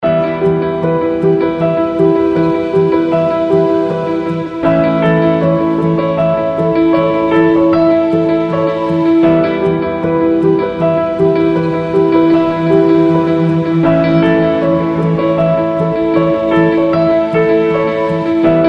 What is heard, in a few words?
ORCHESTRAL KEYS